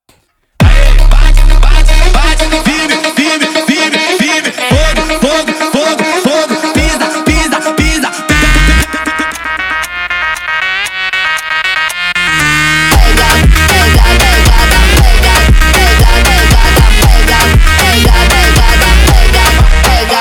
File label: MONTAGEM - Sped Up